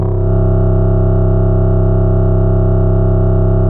Elec Org C2, Fairlight CMI IIe; Keyboards

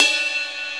CUP

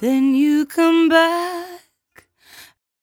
57bpm Am, Velvet Vocal; Zero-G

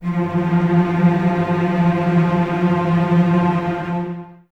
F 3, String Ensembles Cellos Tremolo; Miroslav Vitous

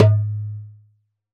Doholla Dom, Roland BK-5 Percussion

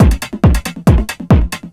drum 138, Techno; Techno ID; PS-45

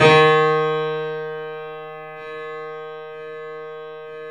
The Piano D#; InVision Interactive - The Piano

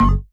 Percs, Elektron MachineDrum SPS1 MKII

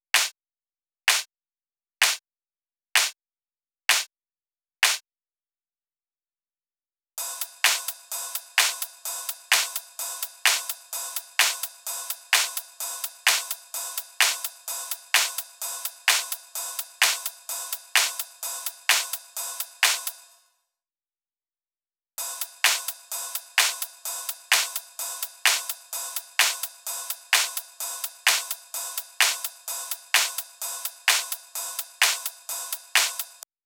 128BPM Clap loop; Essential Clubsounds Vol.5; Break the Chains; Root G#min; Drums & Perc

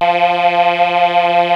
C 605 CHOR, Yamaha C605; TECHNO-BWARE

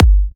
G, Kicks; markus hakala

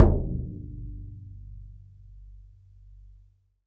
BODHRAN; E-MU Producer Series Vol. 7 – Old World Instruments

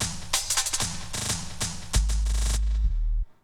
Essential beats

